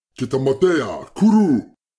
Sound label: combat yells; Kuru; SyrinScape; Iris hill manor